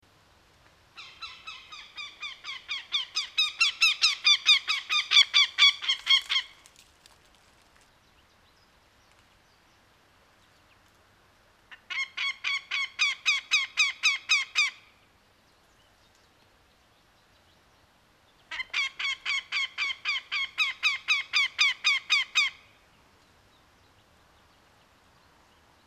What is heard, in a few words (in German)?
vogelstimmen; andrew spencer; nogo